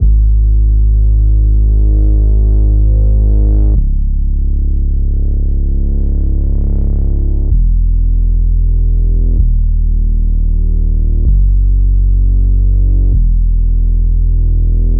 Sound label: 128BPM Bass Root G#; Zeta; Vengeance Samples; Zeta Kit